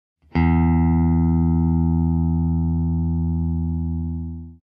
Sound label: Guitar notes; نت های گیتار